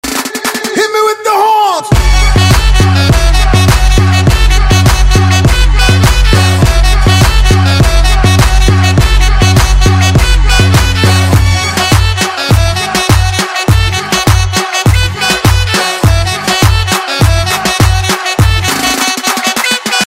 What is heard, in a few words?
HORNS REMIX